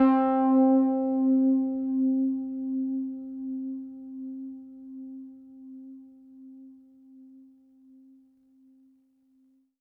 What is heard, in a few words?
Synth Prophet One Shots, Keys C; Electronisounds